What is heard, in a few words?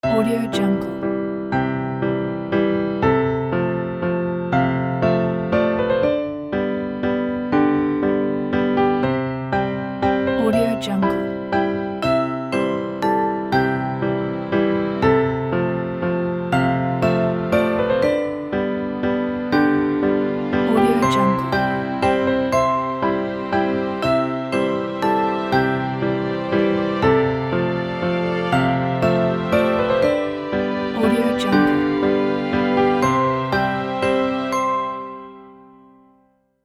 baby waltz; gainmusicproduction